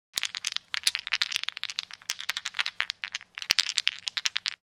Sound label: Casino game dice shaking in hand